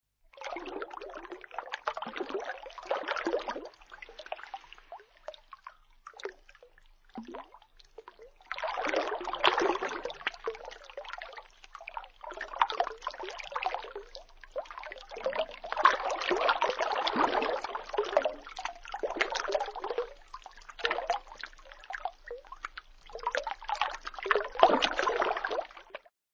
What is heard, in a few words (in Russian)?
plesk reki o bereg